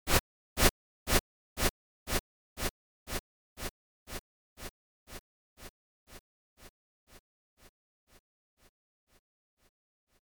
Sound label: bikes low, smart city